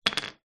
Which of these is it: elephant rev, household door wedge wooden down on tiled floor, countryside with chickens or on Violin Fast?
household door wedge wooden down on tiled floor